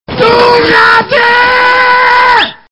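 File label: rattle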